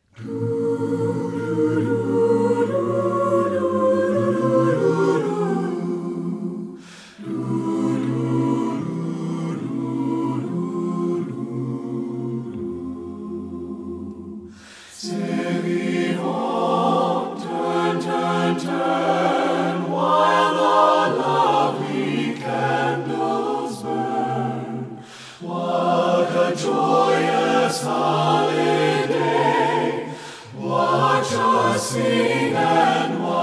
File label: S Vivon Chorus, Jewish folk song; This Jewish folk song; in an expressive, lush setting; is perfect for ensembles of any size. The haunting melody brings a somber atmosphere